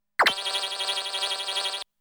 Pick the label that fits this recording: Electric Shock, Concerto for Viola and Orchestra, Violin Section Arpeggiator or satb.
Electric Shock